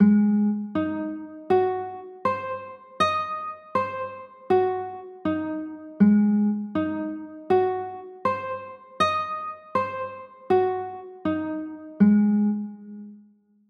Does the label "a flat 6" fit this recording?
Yes